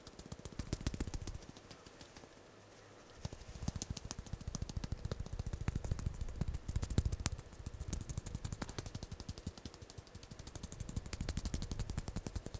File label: LOOP